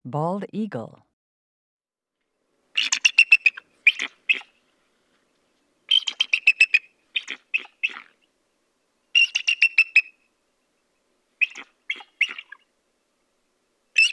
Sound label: Bald Eagle